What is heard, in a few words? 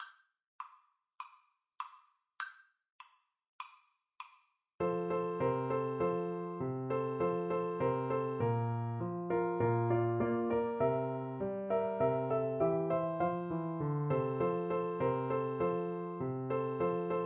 jingle bells, recorder